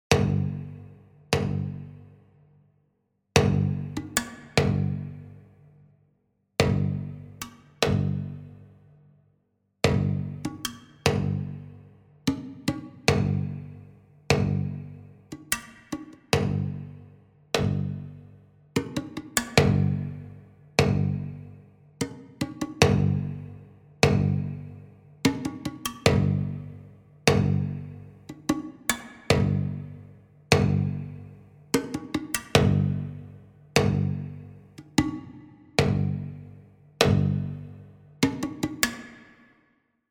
ethno groove

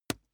wood impact small lash

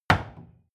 wood wood staff indoors

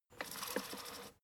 fader plastic stutter regular

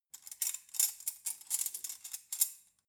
cutlery forks handling

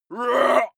male shout hit

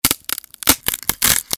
wood crackling breaks

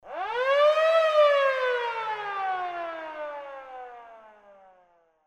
siren

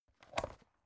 folder open

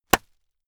plastic bag burst small pad

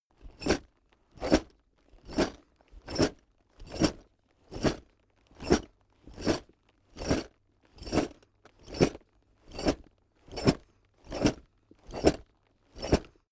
backpack metal sequence

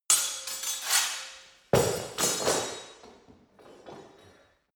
sword disarm

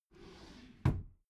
desk drawer close regular

